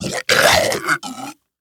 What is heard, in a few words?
zombie skeleton die